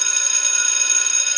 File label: Aircrafts P-51D Cockpit Tail Warning Bell; DCS